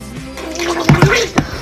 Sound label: Man Gargling Water